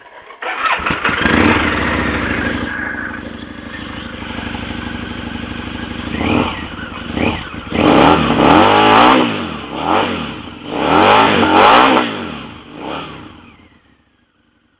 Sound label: v-rod screaming eagle, moto